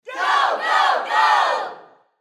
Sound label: Human Crowd Studio; Crowd Reactions Go Go Go; Sound Effects:Voice